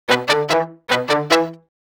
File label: Horns Main; Cinematic Funk Urban Tone Poems; Sonic Foundry (Sony Creative Software)